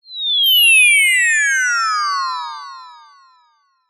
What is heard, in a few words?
descending whine whistle; sound design; zapsplat